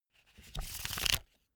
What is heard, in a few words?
pack of cards shuffle hand flutter; comedy-cartoon; spielzeug